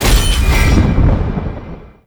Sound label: Void Dragon Glaive Pickup; Enemies; Gameplay; Teshin; Warframe; Duviri